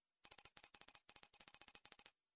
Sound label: Digital Number